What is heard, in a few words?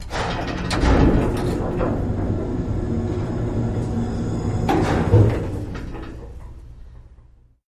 Utility Elevator, elevators